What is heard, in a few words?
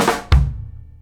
KICK SNARE, Live Snares